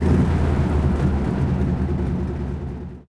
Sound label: engines; World of Tanks